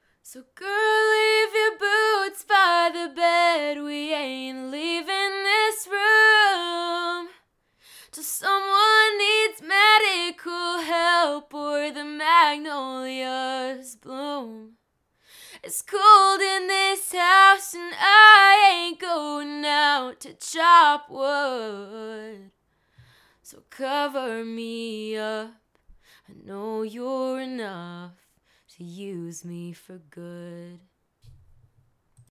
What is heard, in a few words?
singing; EPOS B20 cardioid